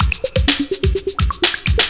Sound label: bubls; Urban Shakedown